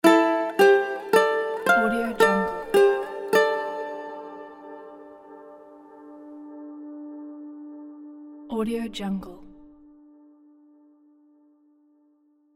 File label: oriental japanese style logo; vintervarg